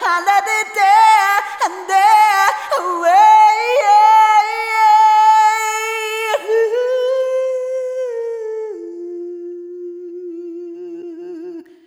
Arrhythmic F; Sonic Foundry (Sony Creative Software); ILONA

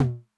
Tom Low Accent High Tone Min Decay, 909

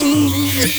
curious, Anime Sound FX

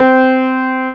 Grand Lo A3, Pianos; Fairlight CMI IIe

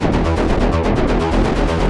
E1, SynthLine; 90 s Sample CDs; NiceBeats; SynthLine VOL-20

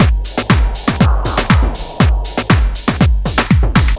storm force, Deep House 120bpm

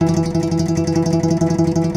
C. Bass Balalaika, Plucked Strings

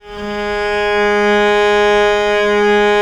VL G#2, VIOLE, Historical Instruments; USB Soundscan vol.23

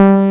MGV BASS; Pro-Rec - Synthsonic; JV ANALOG

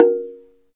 Slit Drums, Africa, Wood & Metal; Roland LCDP11 Africa VOL-1

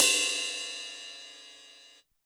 Ride Cymbal, YAMAHA RM1X

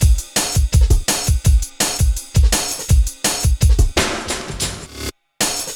Programmed Stepz, Jungle Drum Loops

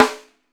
RIMSHOT, Drumworks; Sampleheads - New York City Drumworks VOL-1

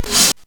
Breath, Jomox Xbase-09; Drums

